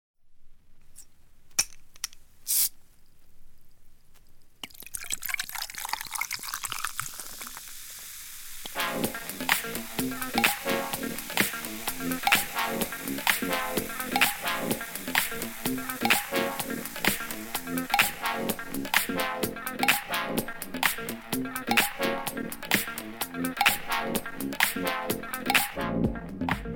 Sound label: french house